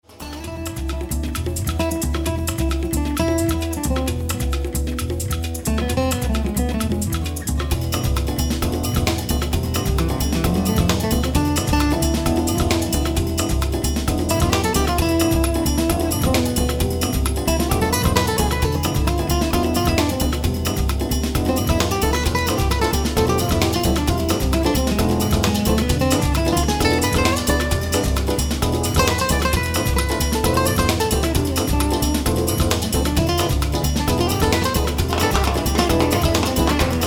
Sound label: solo